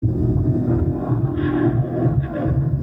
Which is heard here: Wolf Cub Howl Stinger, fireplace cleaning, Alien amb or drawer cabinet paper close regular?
Alien amb